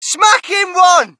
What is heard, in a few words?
crowd, shout; Mike Tyson Heavyweight Boxing